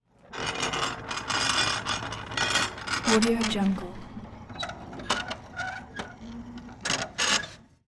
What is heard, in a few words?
fire door open; prosoundfx